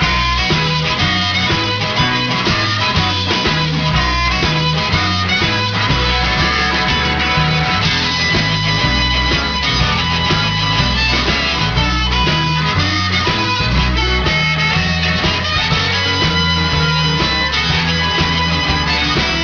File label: blues